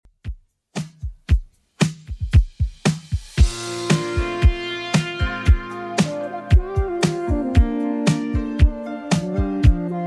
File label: 80s Redrum, 115bpm